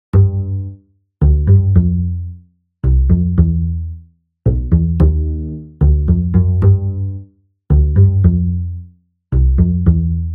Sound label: Carol of the Bells